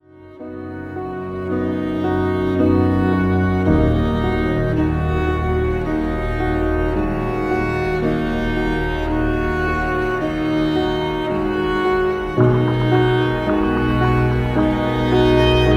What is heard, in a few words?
Ringtone